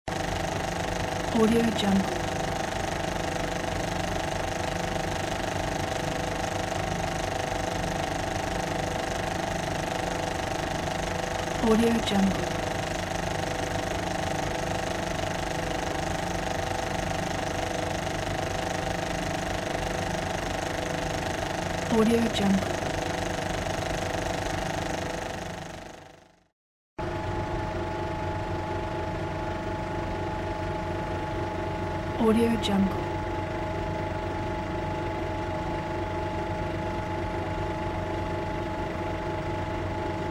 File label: movie projector sound; rolstudio